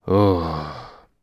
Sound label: human male sigh groan